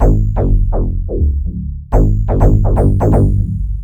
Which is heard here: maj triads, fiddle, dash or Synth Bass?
Synth Bass